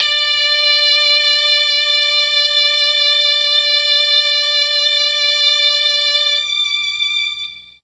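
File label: D4 string brass, mellotron; STRGS+BRASS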